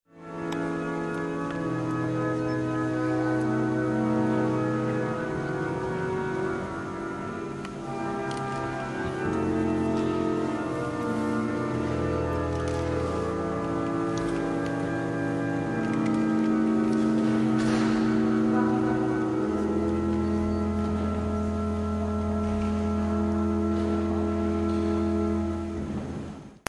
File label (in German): Orgel